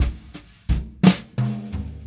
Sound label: Brushes SN SN